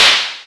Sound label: L9000 Noise, drum machine